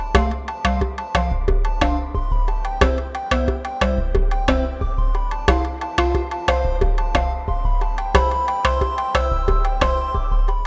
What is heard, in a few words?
Dance Loop; Game rip; MiSide